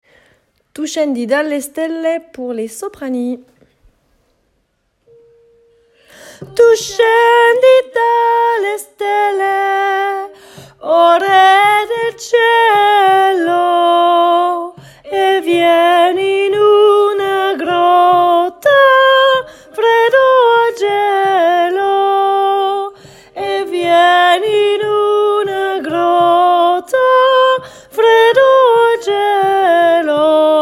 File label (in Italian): soprani; Tu scendi dalle stelle; pupitre soprani